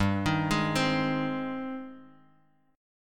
Ab Major, G, guitar chord; arpeggiated; Ab/G; JGuitar

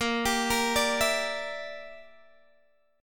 A sharp 6th Flat 5th, Guitar DADGAD

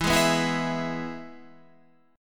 E-Major E, Guitar Half Step Down

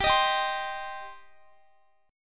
G Suspended 4th Sharp 5th G, Guitar; strummed; 4E,4A,5D,5G,5C,6F

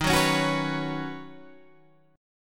Eb Minor 7th Sharp 5th down Guitar Standard; strummed

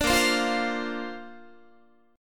A sharp Major Add 9th, Guitar; strummed

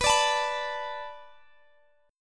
B Minor 7th B Guitar Drop D, chord

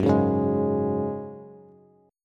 Gb Suspended 2nd, Bass; strummed; 14,11,11,13

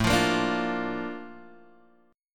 Bb Major A, chord; Bb/A strummed; JGuitar

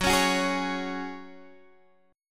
Gb Suspended 2nd, Guitar, Half Step Down

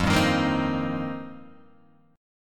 F 7th Suspended 2nd Suspended 4th, Guitar Open G

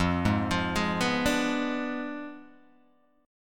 F Diminished 7th F down Guitar Open G

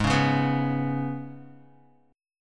G sharp Minor Double Flat 5th G sharp, Guitar; G#mbb5; strummed; JGuitar

